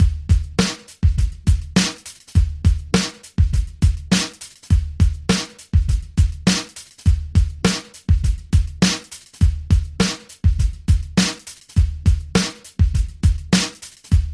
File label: Redrum 102